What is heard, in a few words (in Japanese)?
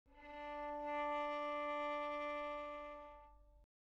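ヴァイオリン, 擦弦; sulTasto; 弦鳴楽器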